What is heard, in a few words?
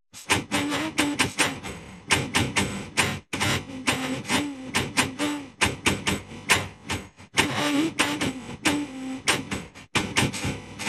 Vocals